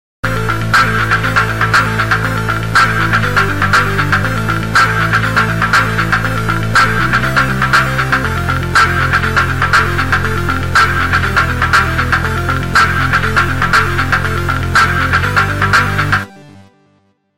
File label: SUBTRACTOR OLD MAN